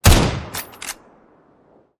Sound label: Gun Sound; Gangstar; Gully Loops